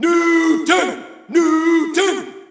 Shout, audience; UFC Tapout 2

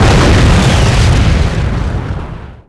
Large Exp, Explosions

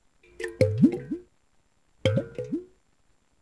glub; Splashdown; XBOX; Soultree

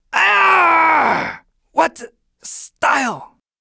hurt; True Crime Streets Of LA; XBOX

